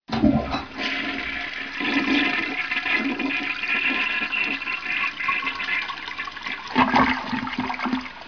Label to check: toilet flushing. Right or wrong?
Right